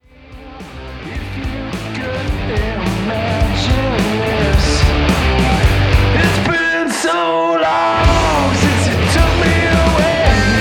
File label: mongo shaker